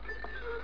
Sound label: game dropping to ground